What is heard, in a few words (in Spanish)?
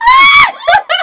risa mujer; Atomix VirtualDJ 8 Pro